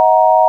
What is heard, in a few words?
sine